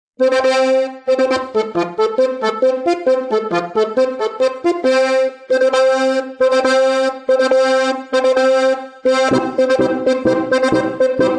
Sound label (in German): Ouvertüre, Harmonika; wilhelm tell; griffschrift